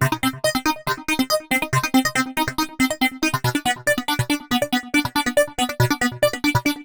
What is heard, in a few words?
Arp 140bpm C, Trance